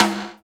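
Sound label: tom rim, acoustic toms; Roland - Rhythm Section; Real Toms 1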